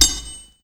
knife bounce; weapons

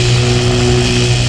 Computer Pillar; gesource; DuClare Chateau

